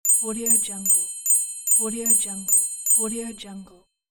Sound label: multiple coin blings; apple hill studios